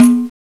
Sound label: TAMBOUR, FAIRLIGHT CMI IIX; PERCUSN2